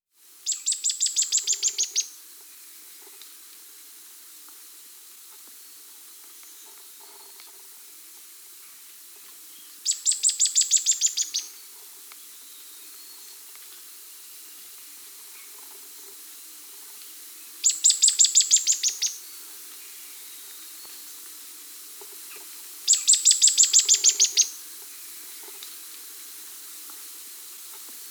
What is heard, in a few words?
Streaked Xenops (Xenops Rutilans); S. America